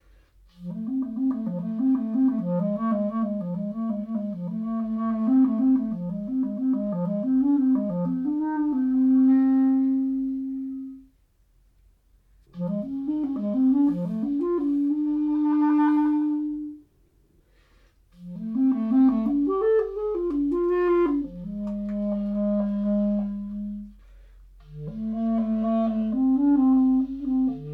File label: Chalumeau venting study